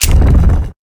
Object Trigger; Inotia 3

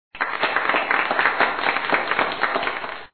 Applause; pencak silat 2002 gamerip online

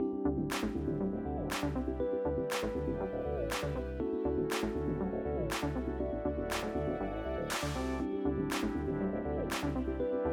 Heart Beat Check; Ring Fit Adventure

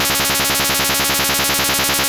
Psybeam Loop; sfx; attack moves; pokemon; gen 2; gsc

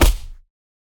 Dash Slam Impact; boomerang fu

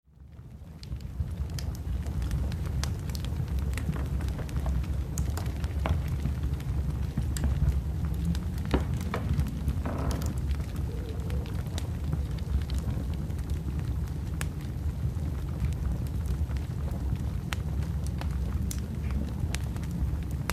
Fireplace Sounds With Howling Wind; fractured minds

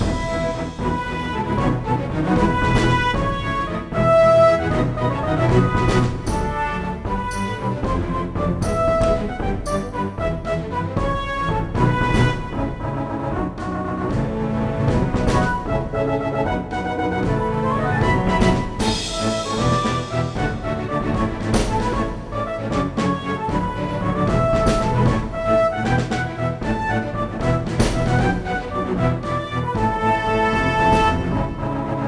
Duel Music Good

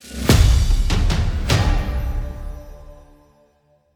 Quest Complete

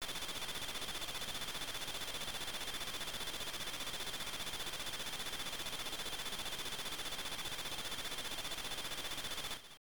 Helicopter; Hyper Somen; MSX2; PSG

